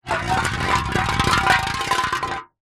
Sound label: food drink soda can empty roll concrete ground